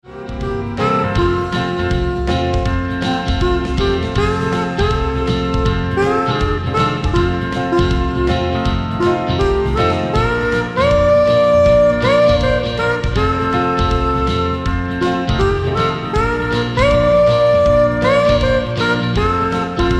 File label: pocket sax